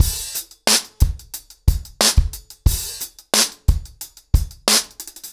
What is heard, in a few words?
ACOUSTIC DRUMS, 90 BPM